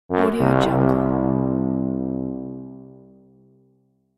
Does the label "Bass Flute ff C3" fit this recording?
No